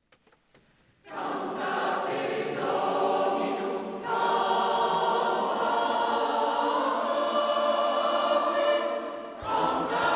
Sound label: Cantate Domino, chorale